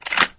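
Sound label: loaded cartridge into sawed-off shotgun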